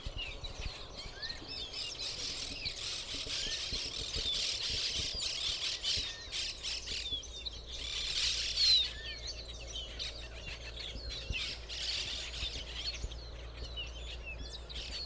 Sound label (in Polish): ptaki chaco